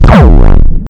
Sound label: sound retrogun wub wub; KSP; Pirate